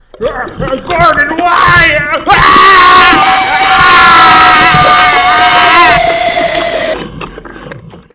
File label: horror